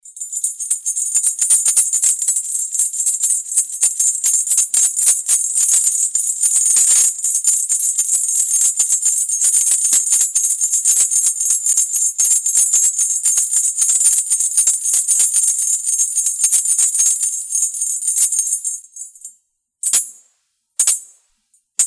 baston sonaja

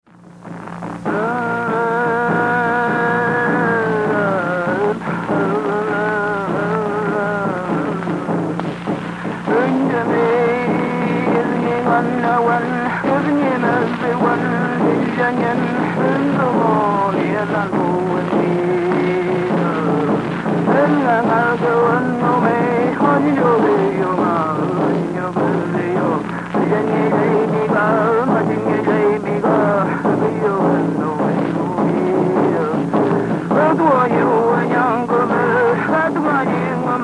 Canto de mujer con kultrun; Música Mapuche

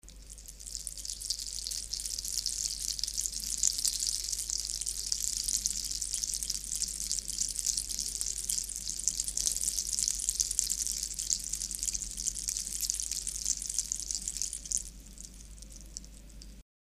sonaja

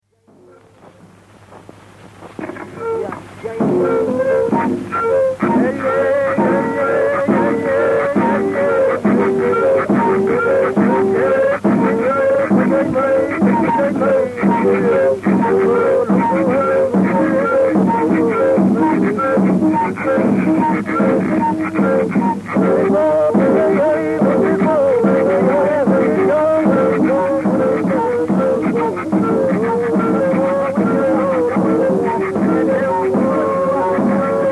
Canto de machi con kultrún pifilkas y cada cada; Collico, Puerto Saavedra, Chile; Música Mapuche

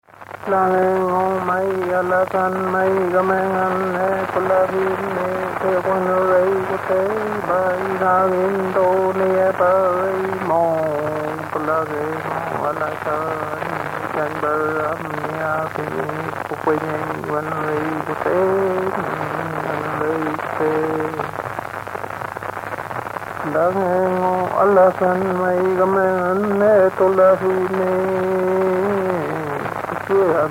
Canto al caballo alazán; Música Mapuche

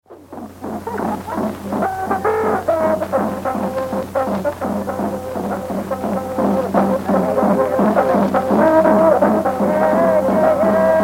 Canto de machi con varios instrumentos; Collico, Puerto Saavedra, Chile; Música Mapuche

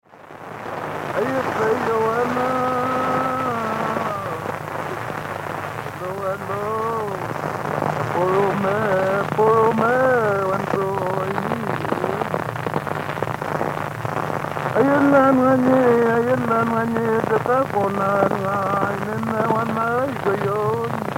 Canto de mujer; Música Mapuche

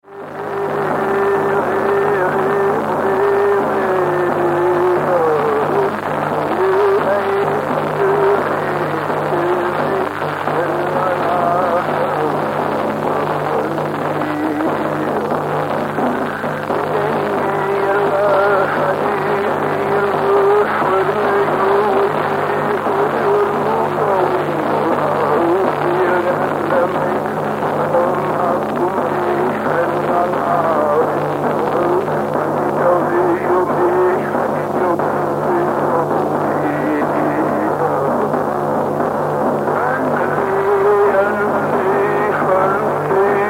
Canto de mujer con kultrún; Música Mapuche

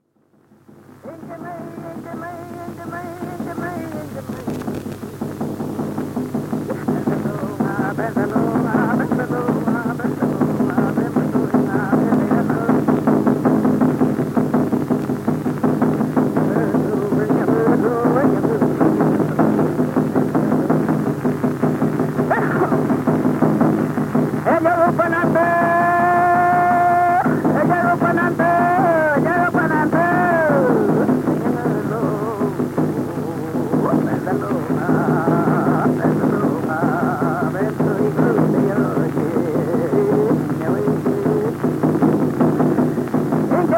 Canto de enfermedad o muerte. Machi con kultrún; Collico, Puerto Saavedra, Chile; Música Mapuche

